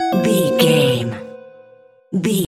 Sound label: jingle chime negative